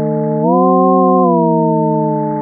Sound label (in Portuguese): Queima Papel; biochaves